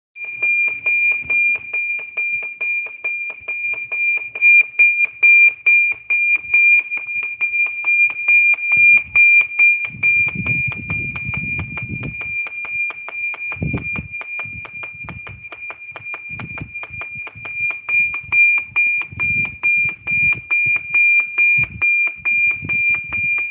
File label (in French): sonnerie evacuation; les sonneries d'alarme au collège